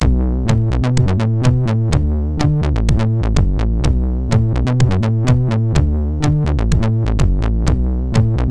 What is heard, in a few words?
Simple Bassline MOS6581R4